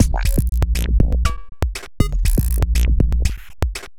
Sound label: Robot Thunder, Modular Electronica; Sonic Foundry (Sony Creative Software)